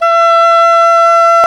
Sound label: Cor anglais; CMI